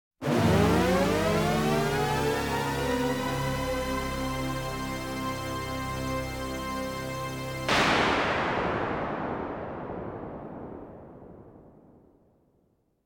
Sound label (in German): Science Fiction Universum